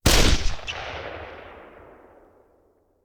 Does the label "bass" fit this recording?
No